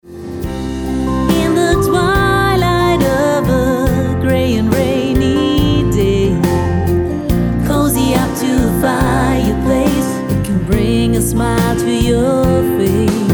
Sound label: Rainy Cloudy Days Jingle